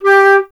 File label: flute G; Miroslav Vitous - Solo Instruments VOL-2; Solo lnst. II